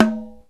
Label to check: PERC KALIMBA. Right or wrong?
Right